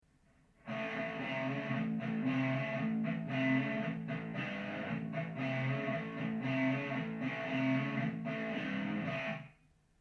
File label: overdrive